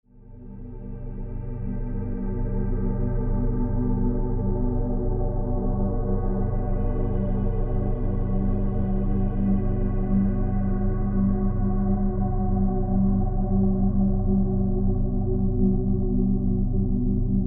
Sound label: Cosmic Tune